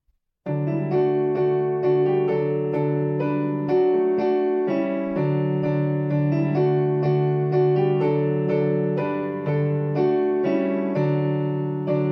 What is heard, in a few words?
Piano Playing; When He Cometh